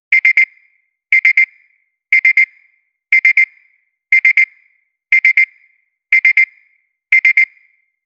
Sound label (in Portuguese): app de luxo alarme de; task